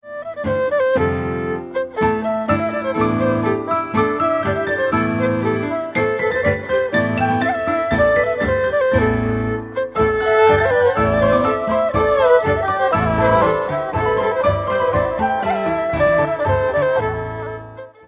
Medieval song

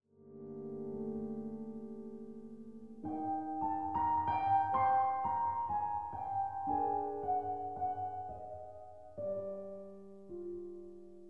piano

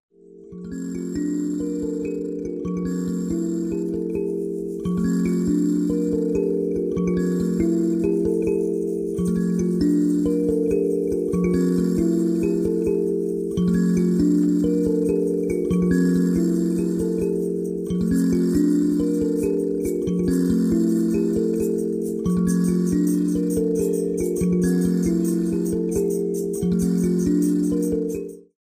mbira song

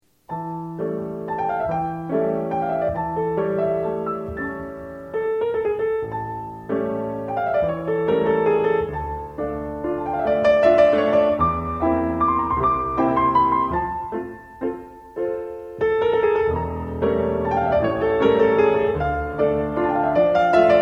tournante; dancearts

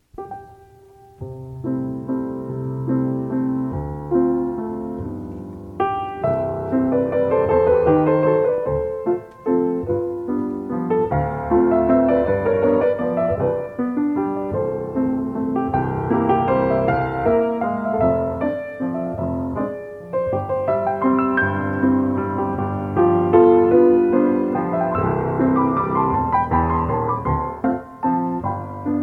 waltz

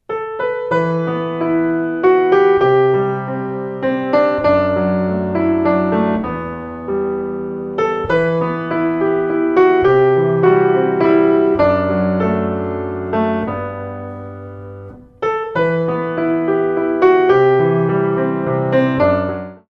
bluebells

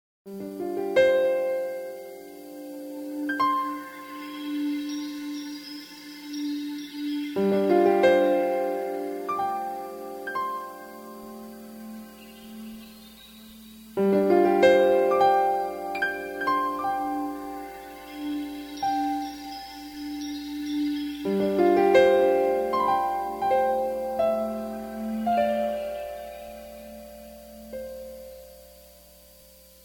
piano

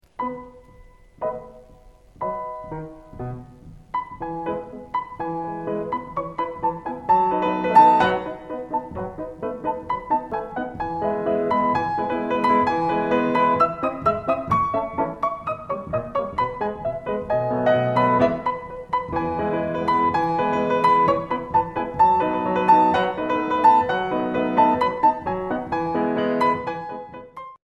petit bat; dancearts

